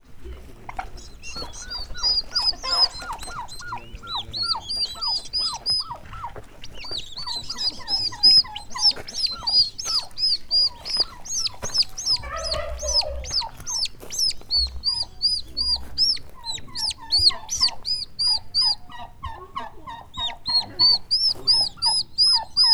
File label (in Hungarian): pulykacsibe közelről; állathangok; miskolci zoo 2009; pulyka kakas